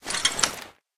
spas12 holster

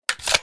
rg6 open; weapons

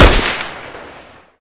groza shoot

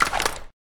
sig220 draw

